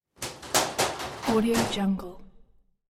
doors sliding concertina shower; blazznet

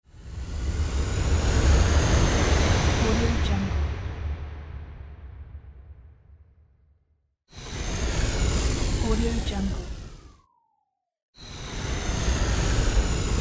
spaceship whoosh

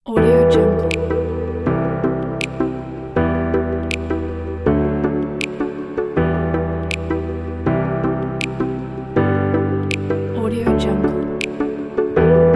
travel vlog future bass

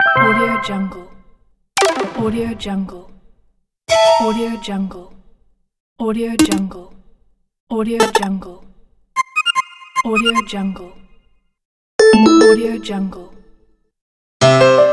user interface games fx sound

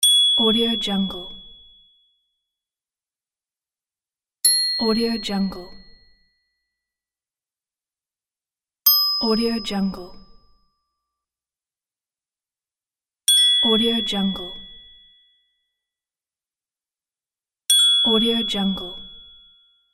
ding

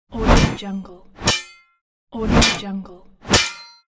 sword on metal

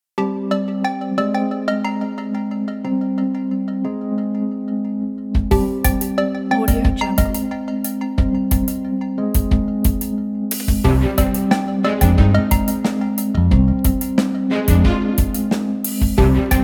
crisis documentary

